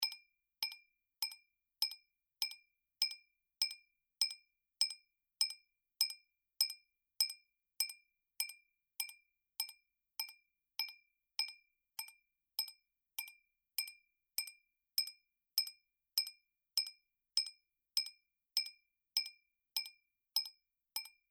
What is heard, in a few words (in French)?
Verre